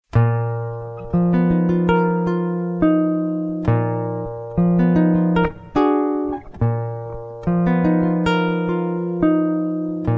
JAZZ, SINGBACK; ENGLISH

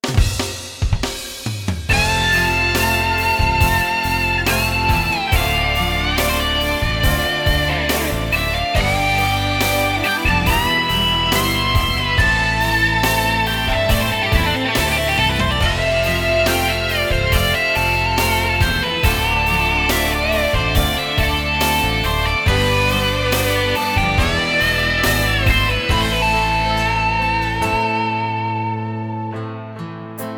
SINGBACK, BULGARIAN

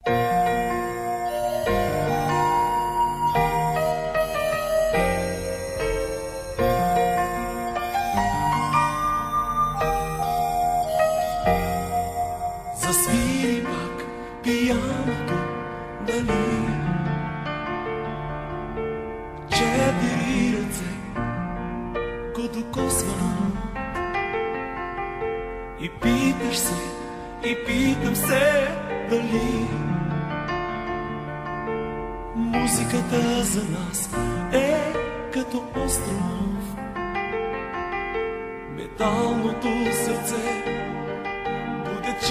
PIANO; BULGARIAN

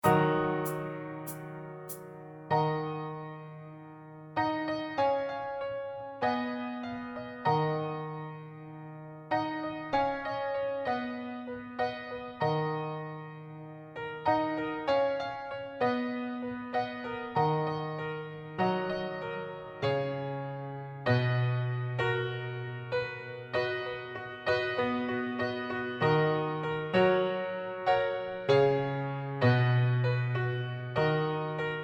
Acoustic; BULGARIAN

